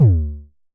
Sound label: Festival Kicks - D2, Kicks, Vermona Kick Lancet; LookIMadeAThing Kicks